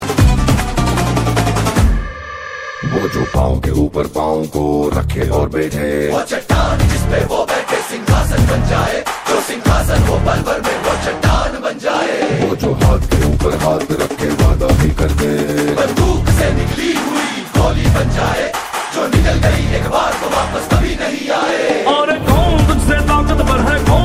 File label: music; Pushpa